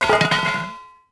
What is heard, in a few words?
manhole clank, impacts explos